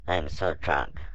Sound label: drunk